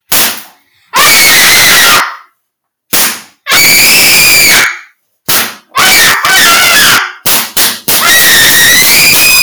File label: clapping bass boosted